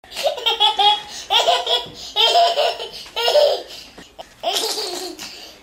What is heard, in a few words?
Baby Laughter